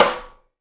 SNARE RIM, Live Snares

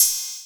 CR8000 Cymbal, Roland CR-8000

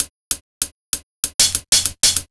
97 DRMS, Pure Electro; USB Soundscan vol.03; AKAI

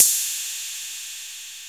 TR-808 Ride

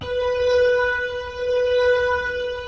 B, The Piano; InVision Interactive

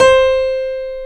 air piano C4

